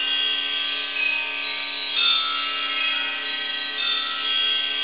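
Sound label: F#3 tingle; Northstar - The Wizard VOL-1; TWEEDLE DUMS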